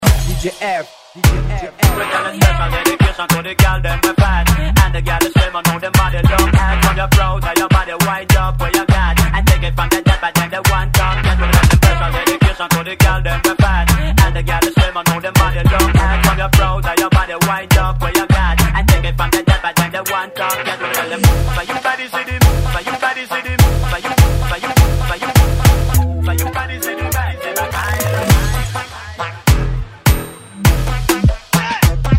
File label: Moombahton Remix